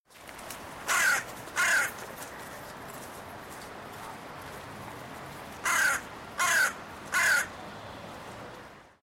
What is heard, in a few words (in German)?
nebelkraehe